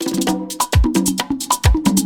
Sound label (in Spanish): PERCUSION; multitracks